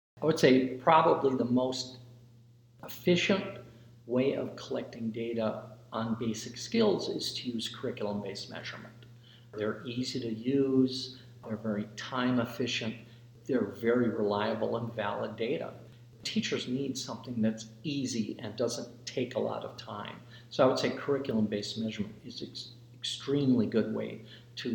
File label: yell